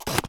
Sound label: Camera Single